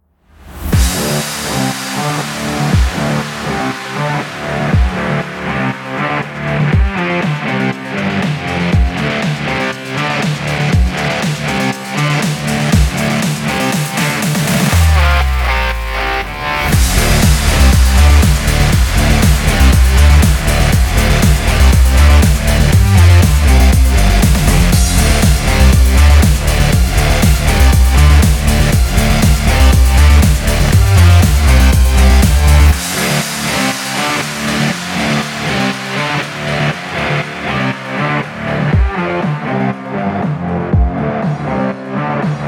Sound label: electro Sport Rock